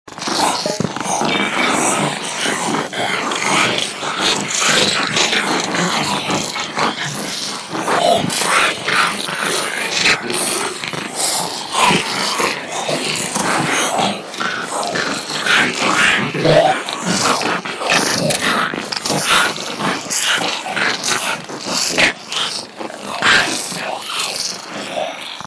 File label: goblins eating